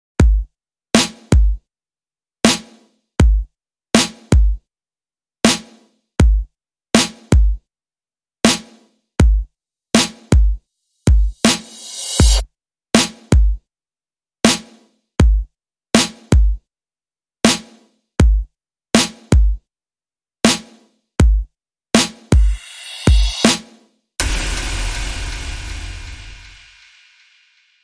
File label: Beat